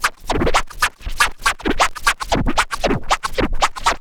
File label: scratch tools; SCRACTH; Megabass Remix! 2